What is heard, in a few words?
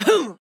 Grunt Hit; Inara; Paladins